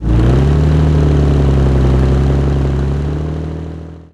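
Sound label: comet engine interior load, tanks; War Thunder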